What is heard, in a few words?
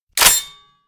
mag in, spas12, weapons; Benny Placeholders